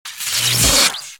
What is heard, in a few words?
forcefield disable